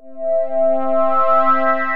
Synth, Analog, Synth Pad; Rockin' Me Synth; Ableton; Factory Packs; Synth Essentials

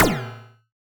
Alien FM Perc Short

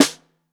Snare Bottom; Ableton Foundation FreePacks; Snare Designer

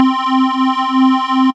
Talk Squares, Synth Lead; Instruments; Ableton; Synth Essentials; Operator

